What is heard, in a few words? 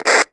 walkie talkie message receive; firefight